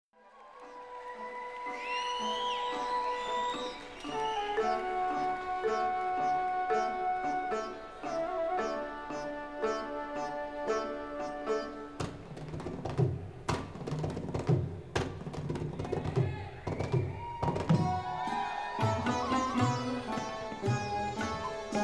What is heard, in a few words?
intro music